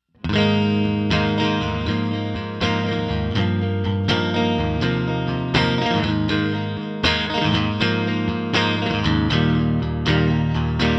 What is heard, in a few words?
LINE6 clarity, helix amp model; Helixシリーズのマルチエフェクター